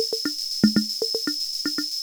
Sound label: Loop, classic machines, rhythm77